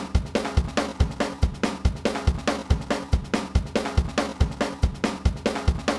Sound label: zydeco